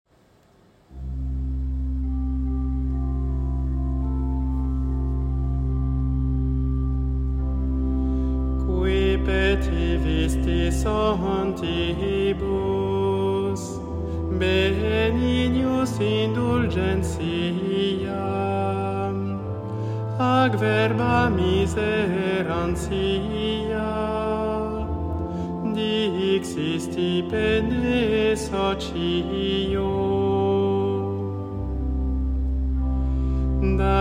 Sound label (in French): sexte latin; office defunts